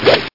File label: Wood hit; worldgolf